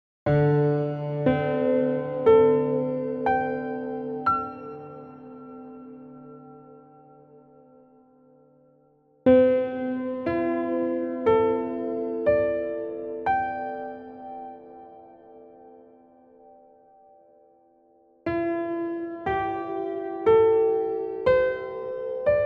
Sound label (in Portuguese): escala slendro